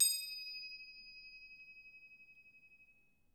D#4, Percussion & Harp; Miroslav Vitous - Percussion & Harp

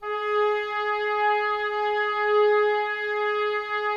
OBOES G#, Wood Winds; Miroslav Vitous - Woodwind Brass Ensemble

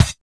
BD, 160 BPM, Drum & Bass; Ueberschall; Drum & Bass Flash Trackz

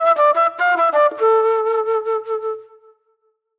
Disco Flute

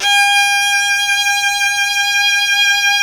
Synclavier Strings; ILIO

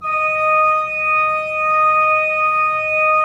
3D Pipe Mix Celeste, 3D Organs

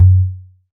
Surdo Open L, Percussion; Roland BK-5

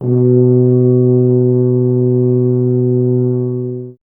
BRS TUBA, Solo Instruments

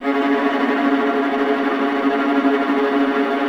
TREM F, Orchestra; AKAI Advance Orchestra Upgrade 97 Vol.1

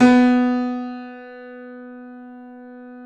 KEY B 2, YC7 Piano mf; Roland - Rhythm Section

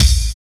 NULL KICK, HIP-HOP; Drums In Your FACE!

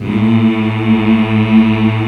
MALE HUM A1, ENSMBL; AKAI S-Series CD-ROM Sound Library VOL-8

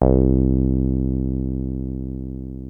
303 C2, TB 303

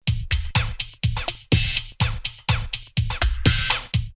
Swing&Sway 124, drumloops, Techno&House; X-Static Goldmine

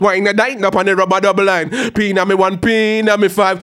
HAL RAGGAM, RAGGA-MUFF A maj

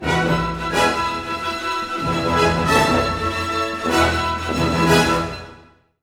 HORSE, Western; East West; Scoring Tools; ORC

